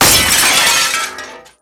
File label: mirror break